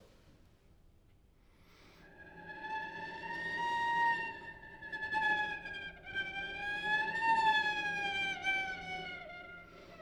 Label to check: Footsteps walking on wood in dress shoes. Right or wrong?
Wrong